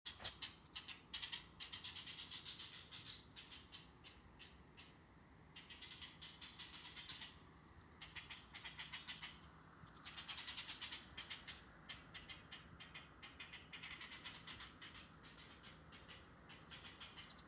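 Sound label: blue rock thrush; isohiyodori; jinaki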